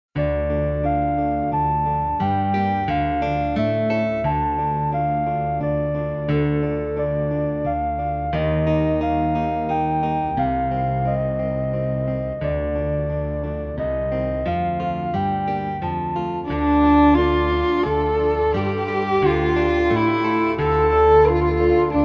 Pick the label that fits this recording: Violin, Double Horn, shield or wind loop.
Violin